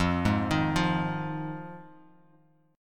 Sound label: F Minor 7th F; JGuitar